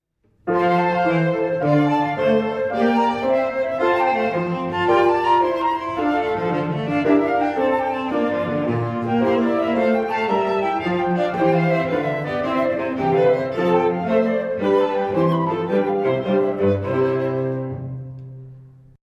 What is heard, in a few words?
Flute Quartet